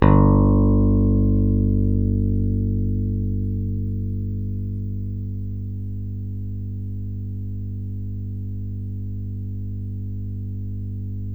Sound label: PICK BASS, Electric Basses; PlatinumPhattCD2; E-MU Producer Series Vol. 8 – Platinum Phatt